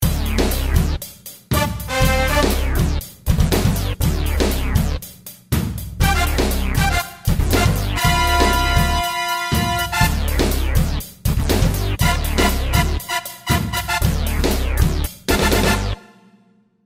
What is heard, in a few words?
Funky Horns